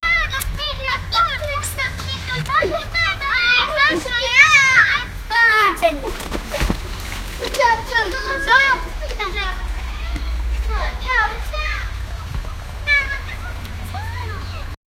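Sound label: kids running and playing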